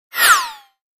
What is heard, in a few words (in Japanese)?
ヒュン音